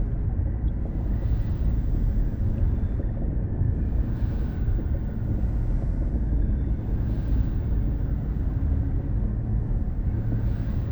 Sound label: juggernaut idle; aquatics